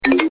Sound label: Notifier; Blackberry Bold